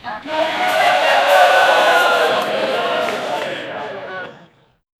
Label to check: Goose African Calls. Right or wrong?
Wrong